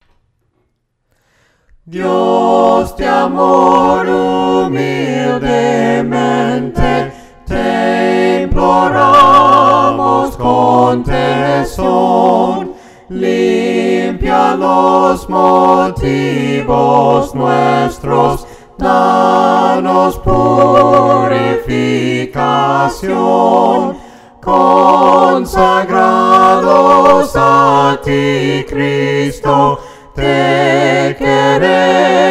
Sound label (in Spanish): DIOS DE AMOR TE IMPLORAMOS VOCES